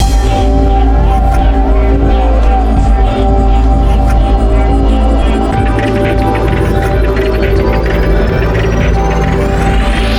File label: BGM; Luxembourg Zwee